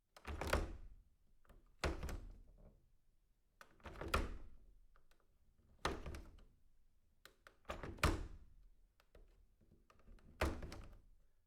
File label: sliding window latching